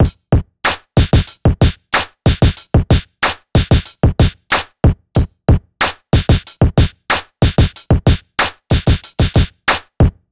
E Coast HipHop Drums; East Coast; Digidesign; Pro Tools; Pro Tools Essential Loops

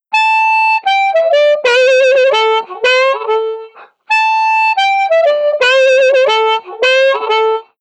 Blues Harp Intro; Digidesign; Pro Tools Essential Loops

